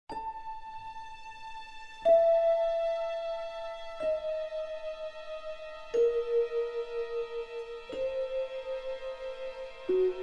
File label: Music